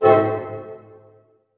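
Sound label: harp hit D# minor; Sound On Sound; Peter Siedleczek's Orchestra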